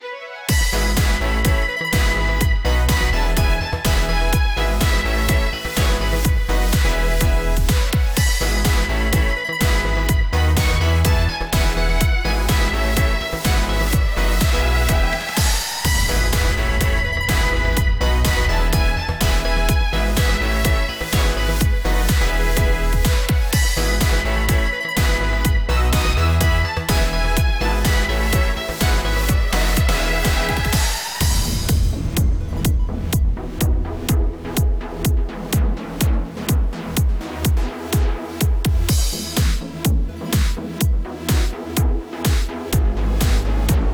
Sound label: B Menu Event